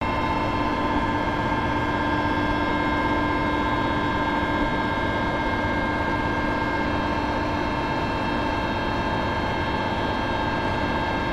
industrial zone

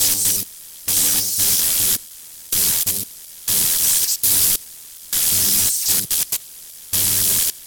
electricity

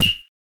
pico pico hammer

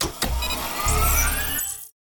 menu, button

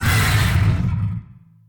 dragon cry

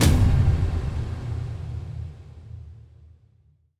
don, anime

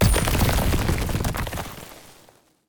pick axe break

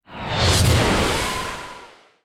punch slow

